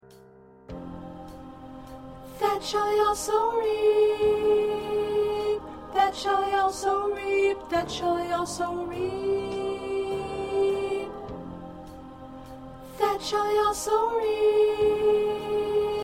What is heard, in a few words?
Soprano